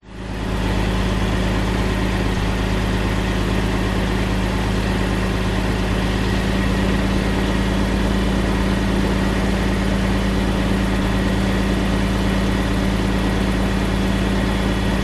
vehicles truck articulated engine idle side cab external